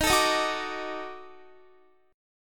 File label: D sharp Minor Major 7th Flat 5th, Guitar; strummed